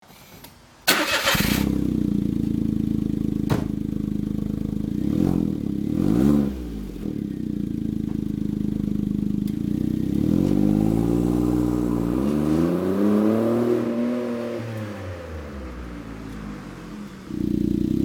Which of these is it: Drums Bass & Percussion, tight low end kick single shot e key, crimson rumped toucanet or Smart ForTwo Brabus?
Smart ForTwo Brabus